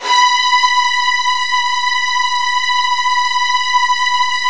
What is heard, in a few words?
B3, Virtuoso Strings; ILIO; Kirk Hunter Virtuoso Strings VOL-1